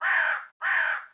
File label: winter crow; World of Tanks Blitz; ambient medvedkovo